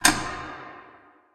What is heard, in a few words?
elevator off, underpass; ambient